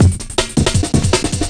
Classic Beat, Drum N Bass

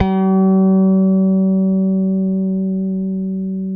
G#4, compresence, bass; Spectrasonics; Bass Legends

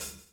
BLOW; Spectrasonics - Backbeat

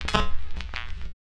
SFX, Drum & Bass 170 BPM; Ueberschall; Drum & Bass Flash Trackz

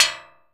XTRA PERC

